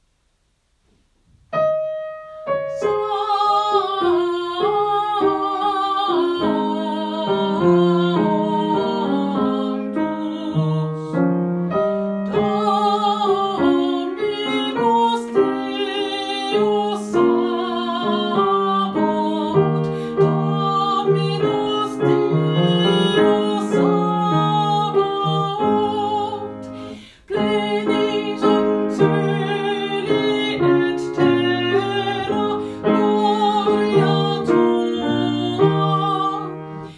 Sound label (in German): Croce Sanctus Alt